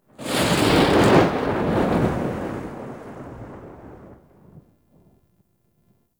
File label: Teleportation Device Thunder Strike; Labs; Ambience; Warframe; Entrati